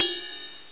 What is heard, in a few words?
bell; YMDEMO